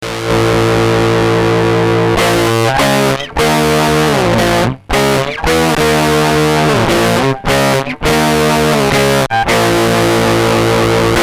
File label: gudok stomp